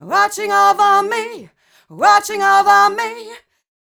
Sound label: f mel d flat, fem Vocals; Mutekki Media; Diamond Vocals 2